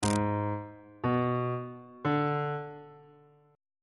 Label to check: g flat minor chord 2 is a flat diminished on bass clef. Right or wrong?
Right